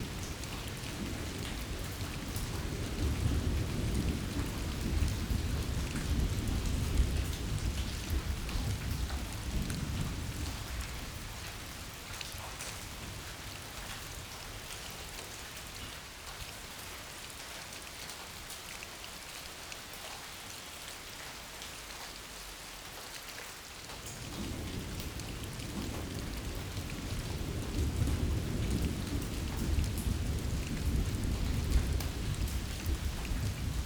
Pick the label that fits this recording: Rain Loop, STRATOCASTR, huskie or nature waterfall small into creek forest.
Rain Loop